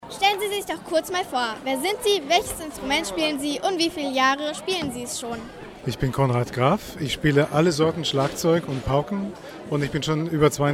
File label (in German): schlagzeug